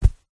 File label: footstep single walking on thick fabric stomping; zapsplat